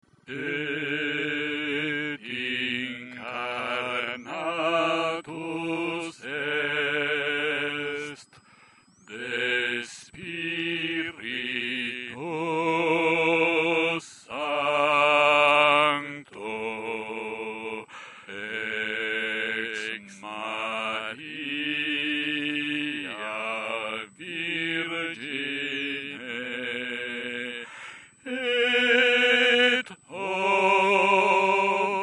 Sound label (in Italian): Et incarnatus est - Bassi; Natum de Maria Virgine